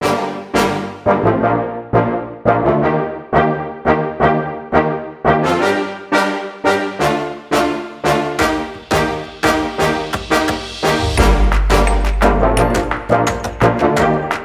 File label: Environmental; Anger Music Library